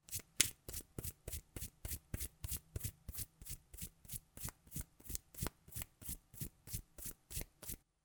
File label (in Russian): zvuk palcev